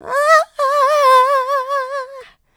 vocal house adlibs; PS-28 Vocal House Samples

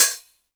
Open Hihats, Cymbals; Vengeance Samples

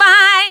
Vocal Oneshots; Vengeance Samples; Vocals

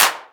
Claps

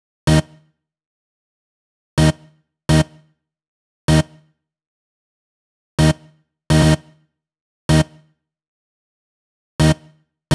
126BPM Lead Root D#; Vengeance Samples; Lineup Kit

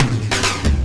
Loops Fills; Vengeance Samples

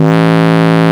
Bass Root A

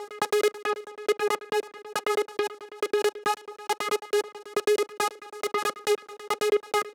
Synthline 138BPM, G#minor; Melody & Synth; Vengeance Samples; Manhattan Kit

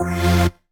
Synth Shot; Vengeance Samples

